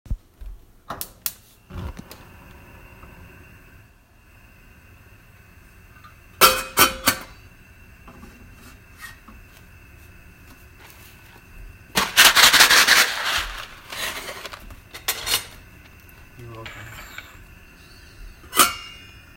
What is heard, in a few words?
Stove